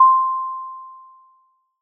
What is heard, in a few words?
Lo Sine Notification